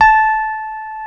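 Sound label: CP70 D7, Yamaha; East West; Global Sounds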